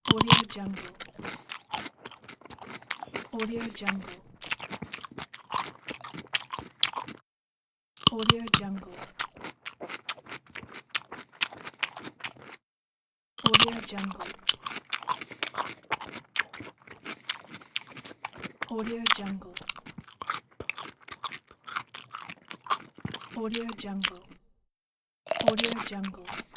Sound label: the crunchy bites and chewing